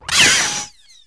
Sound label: Hit